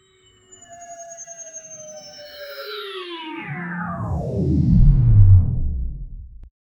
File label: futuristic ui futuristic, powering on